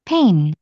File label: pain